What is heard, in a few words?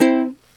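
Ukulele C major Hit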